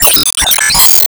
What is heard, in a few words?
Team Skill Tap